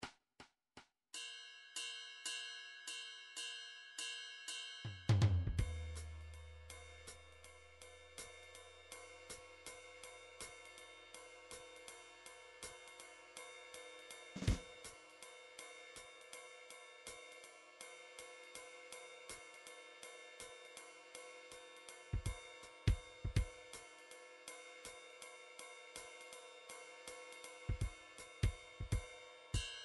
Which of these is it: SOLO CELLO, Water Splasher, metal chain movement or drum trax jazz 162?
drum trax jazz 162